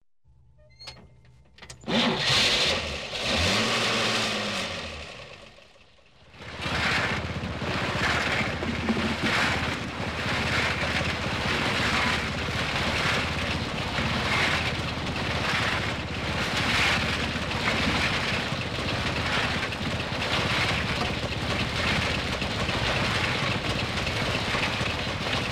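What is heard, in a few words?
cement truck engine and concrete mixer start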